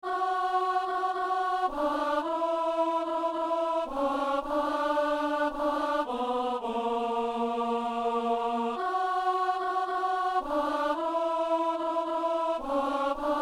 alleluia psaume, alto

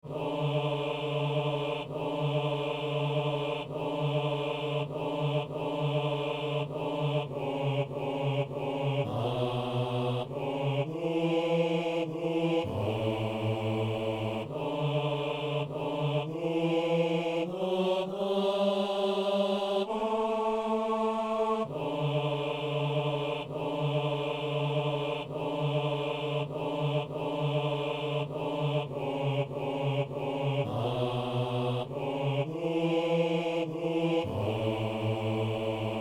basse; Messe de Saint-Boniface - Agnus

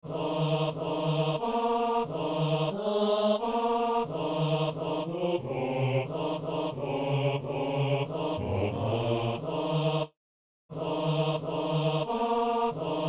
basse

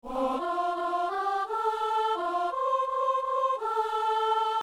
psaume, soprano; Messe du 17 janvier à Saint-Denis; Psaume 18 (19)

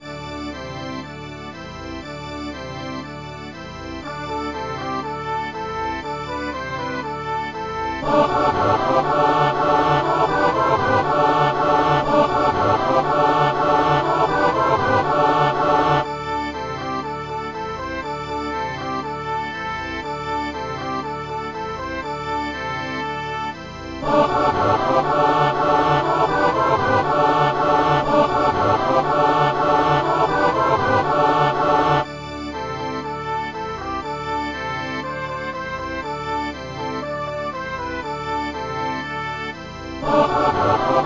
toutes les voix; Messe du 17 janvier à Saint-Denis; Alleluia Carillon

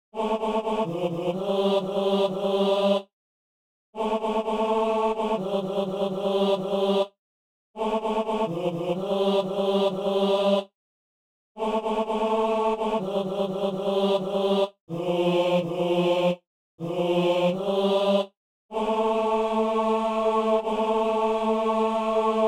basse; Messe de Saint-François-Xavier - Gloria

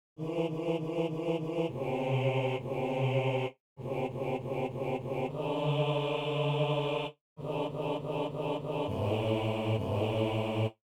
basse; Notre Dieu s'est fait homme

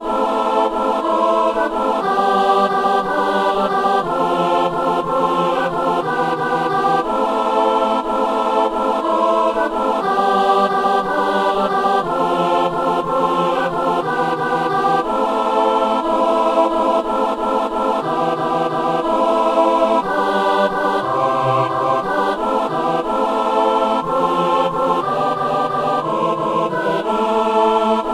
alleluia psaume; 148

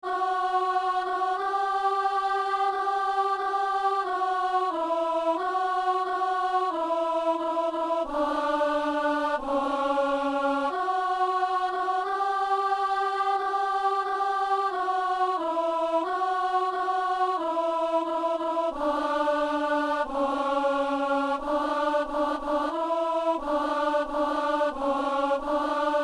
gloire a dieu paix aux hommes, alto

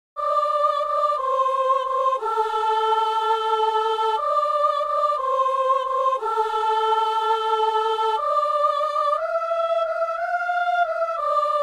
messe simple, gloria, partie d seule, soprano

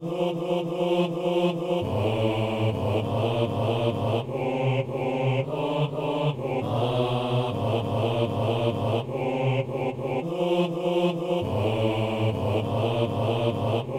basse; Saint-Denis; Acclamez votre Dieu

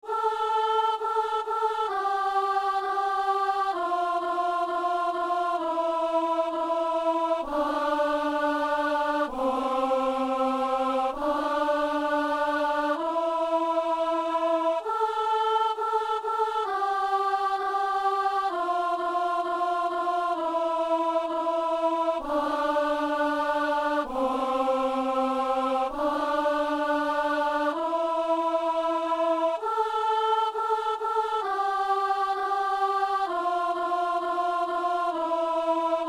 solo

